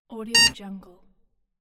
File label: beep barcode signal